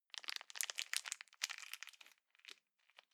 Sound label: plastic bag small pocket stick in soft